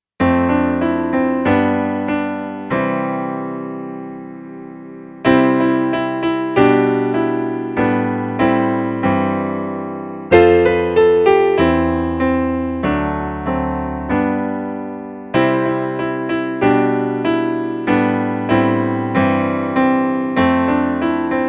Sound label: Sample Accompaniment